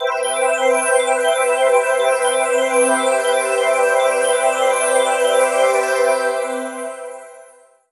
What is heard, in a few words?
Hat Descend (Jingle); StreetPass Plaza